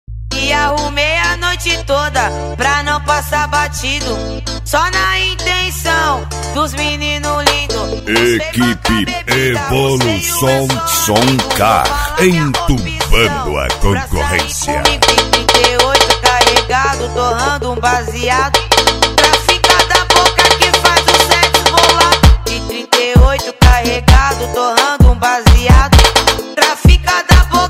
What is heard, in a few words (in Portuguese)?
som car; reboque; douradina parana; ostenta som; equipe evolusom